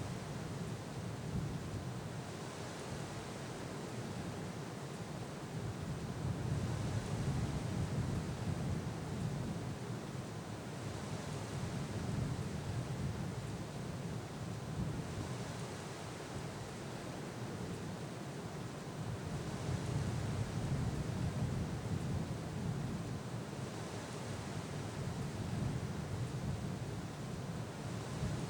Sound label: Wind in heather loop